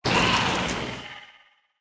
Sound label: Roar